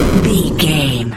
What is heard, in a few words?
retro explosion general